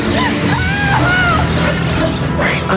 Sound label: scream